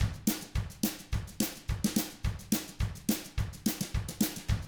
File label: TRAIN, Double Platinum Drums; ILIO; Double Platinum Drums 1